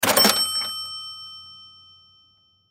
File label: Cash Register, Cash Register Drawer Open; Cash Registers; Office; Blastwave